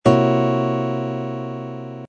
c dominant seventh flat five flat ninth chord voicing, guitar